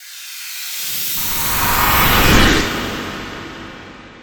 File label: teleport in